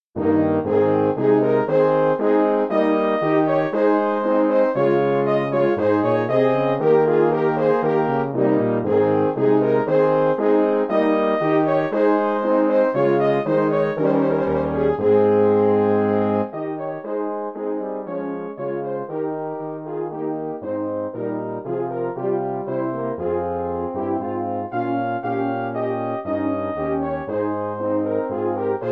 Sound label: Trumpet Tune